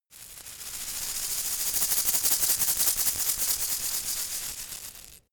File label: insect shaker rattle dynamic